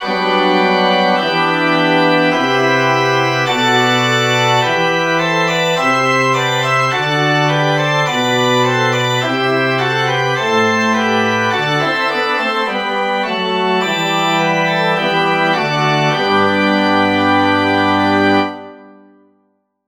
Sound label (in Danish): forspil